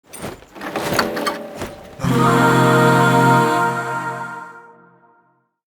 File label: Void Shawzin Equip; Warframe; Zariman